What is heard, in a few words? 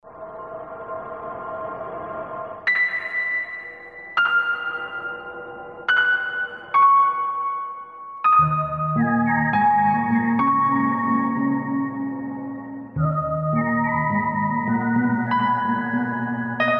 Water Temple (Cursed)